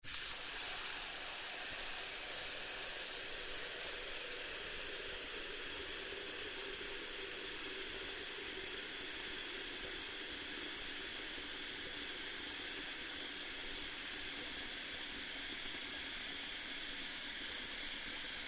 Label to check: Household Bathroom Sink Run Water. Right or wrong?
Right